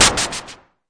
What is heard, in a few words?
mbrute noiz, Arturia MiniBrute; DRUM MACHINES